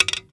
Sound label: Arrow Nock; Timelock VR